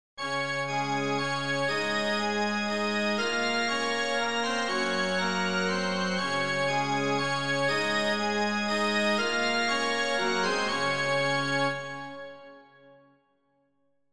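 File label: ancient church organ rhythm background sound; organist; film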